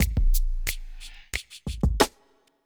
bell ringer 90

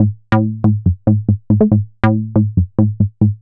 bassline, dance; Basses

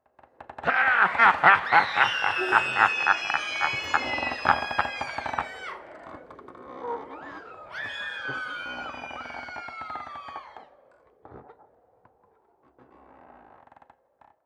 Evil Laugh

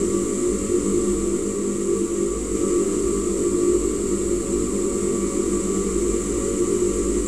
Ambience Drone; Dance; SFX

